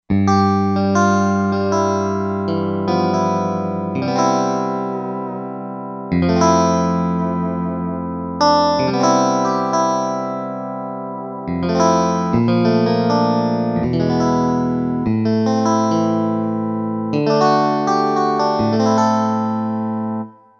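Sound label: Guitar Vintage Strat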